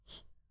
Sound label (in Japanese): ブレスショート